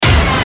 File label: Hit Hard Chord; Blastwave